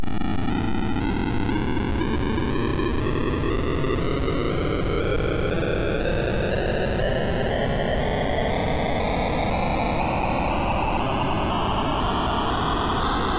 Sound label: noise